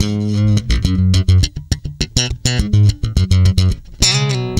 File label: G#, tweaky, bass; Spectrasonics; Bass Legends